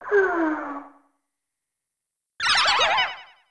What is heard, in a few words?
piranha plant dies